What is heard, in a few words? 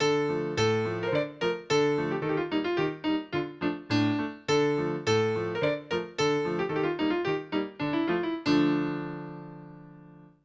melody; d minor